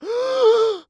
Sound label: Human Male Gasp